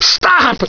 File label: hurt